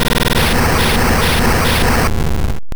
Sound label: Fire Spin; sfx; attack moves; pokemon; gen 2; gsc